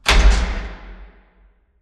Assembly Conveyor Start Close; Boomtown; Titanfall 2

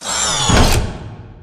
Delivery Cage Lower Shut; Titanfall 2; Boomtown

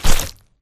Mvmt FS Jog Mud Layer; AI Stalker; Titanfall 2

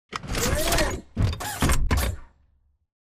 alert idle to walk; Titanfall 2

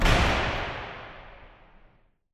radar tower stop clunk; Titanfall 2

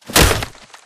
crawl grunt; Titanfall 2

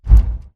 bt footstep jog parts; Titanfall 2

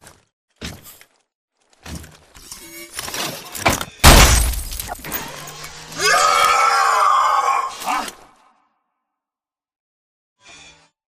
Victory Stomp; Coliseum; Titanfall 2

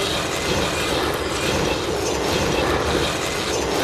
zipline loop; Titanfall 2

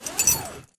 zipline detach; Titanfall 2

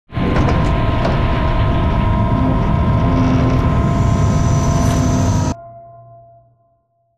Giant Floor Panel Assemble Final Lift Servo; Boomtown; Sideways Town; Titanfall 2

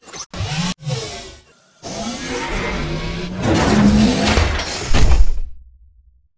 titan stand up; Titanfall 2

